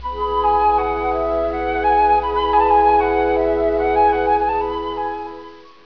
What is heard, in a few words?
flute; linuxfocus